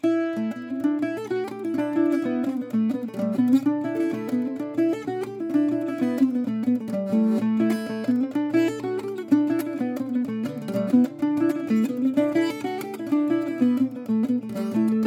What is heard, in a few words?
octave mandolin